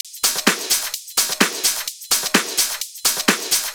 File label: Minimal Loops